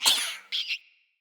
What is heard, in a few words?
Vocal Gross, Animals; Player; Vocals; Floppet Player